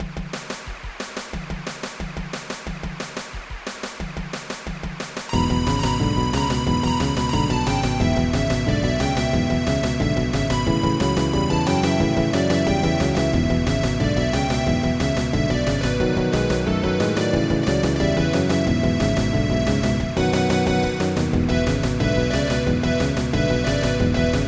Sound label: blu funk